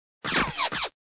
explo

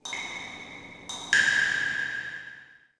PIPES, pipes

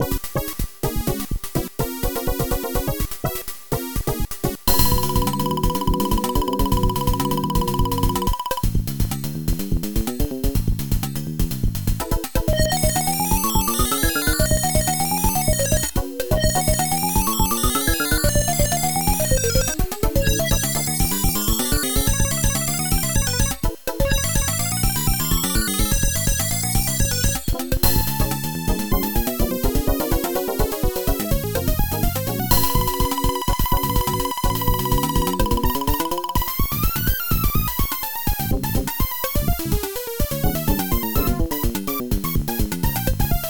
COOKED; ShareWare OnLine Volume 2 (CMS Software)(1993); music

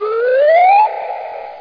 spider

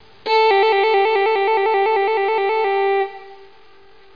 violin

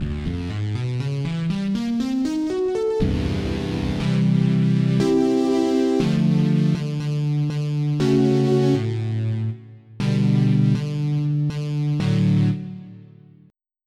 Fuzzy Stick; sfont2

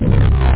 zap

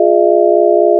lazer miss; PC Gamer IT CD 48; Big bang

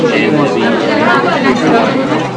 crowded; Sound Library 2000; Wayzata Technology

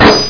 CHISEL; os2

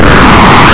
Rocket flyby; Hardercore Sounds

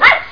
Yap!

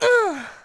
Hit

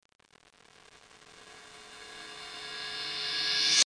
rev cym

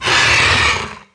horian attack; DAoC; Toa; Gamestar

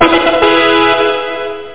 Level Up; Realmz 5.1.6

